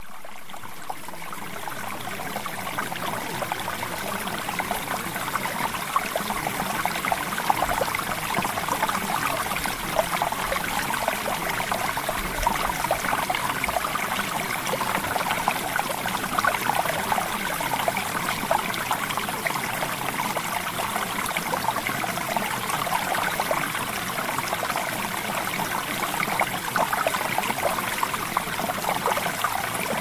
Water

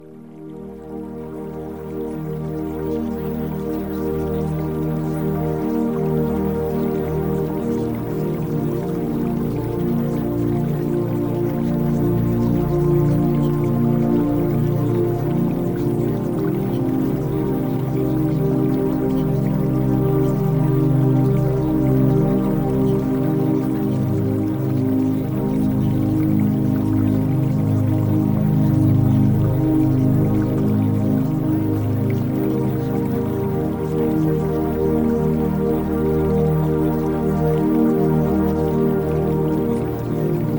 Music

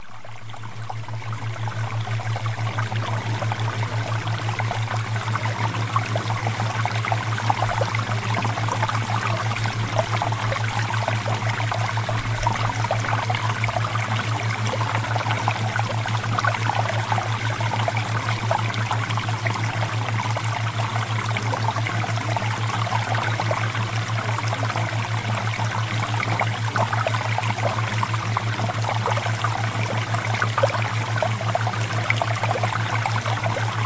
Water Theta Waves

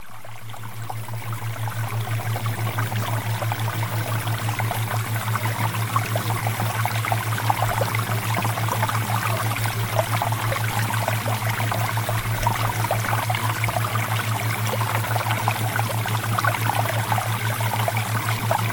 Water Theta Waves; Premium Subliminals

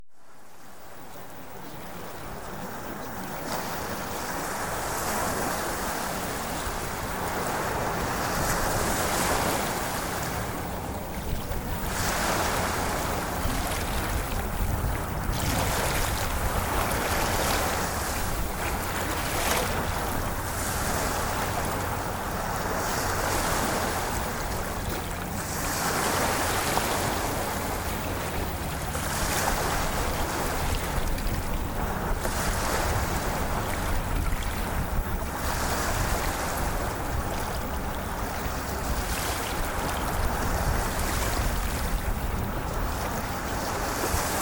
Ocean Waves

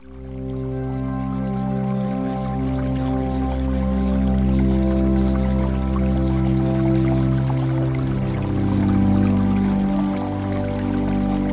Music Sample

